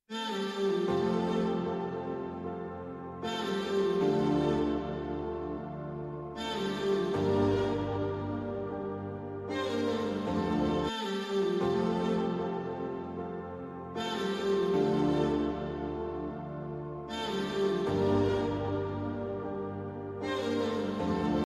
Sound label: song bgm ringtone instrumental music ringtone